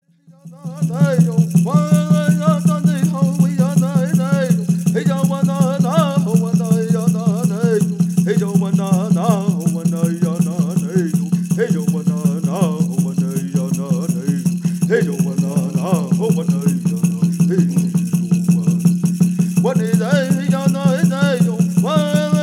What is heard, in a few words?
Ceremonial Song